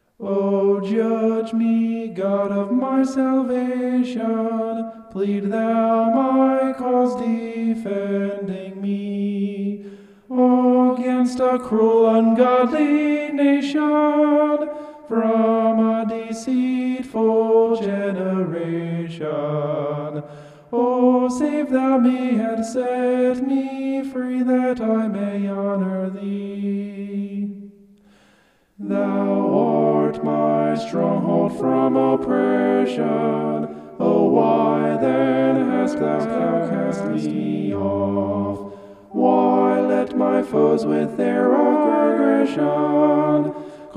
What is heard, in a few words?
vocal homoph; psalm 43